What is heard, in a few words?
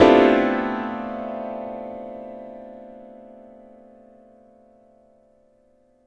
Piano Slam